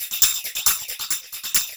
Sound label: TAMB; Zero-G; Chemical Beats